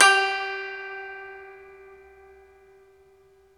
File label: SU GZHENG, Earth Tones